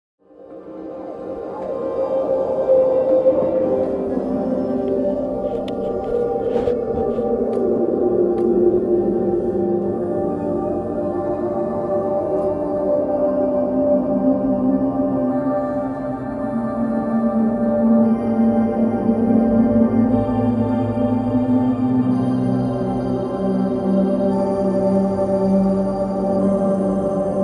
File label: Underwater Mystery